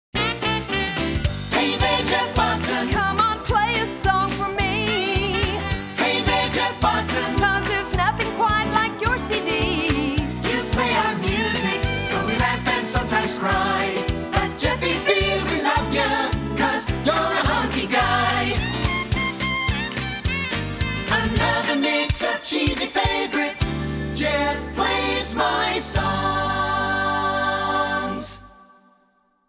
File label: Jinglebells